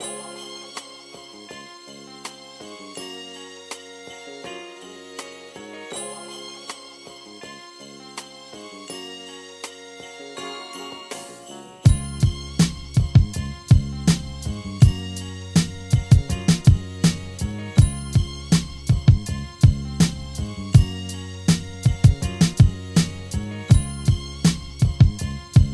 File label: Lofi